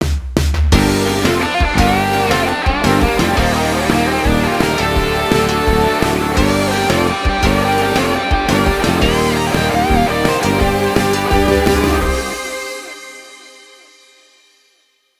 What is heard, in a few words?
Battle Start, soundtrack; Auto Age Standoff